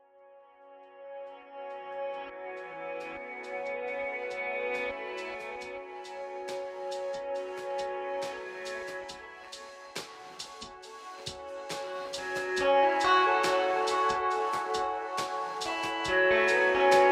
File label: 4 Octaves Higher